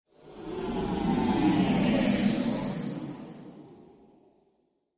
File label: Sci Fi Spaceship; Spaceship Pass By Burning Thrusters Synthesized; Spacecraft